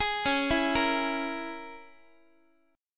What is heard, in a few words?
Db Minor 6th Db down Guitar; Dbm6 arpeggiated; x,x,11,11,8,9; JGuitar